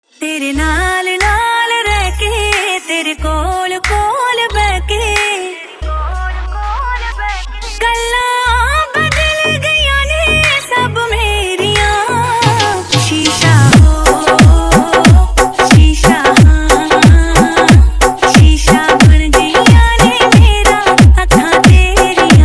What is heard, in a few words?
Remix Punjabi Song